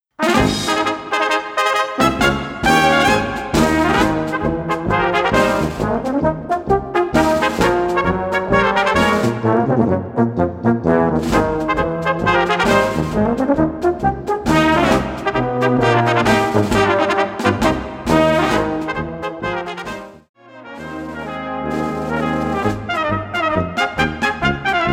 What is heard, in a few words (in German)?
Marsch